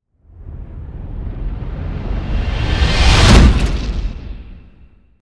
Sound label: Rising Synth Whoosh; Hollywood Edge Sound Designers Tool Kit